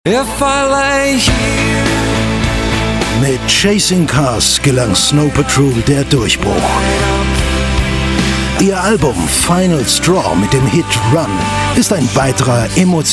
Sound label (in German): Werbung Musik